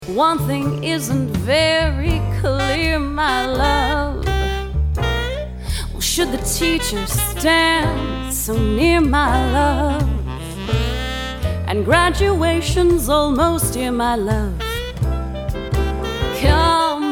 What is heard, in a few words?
Jazz Band; Seattle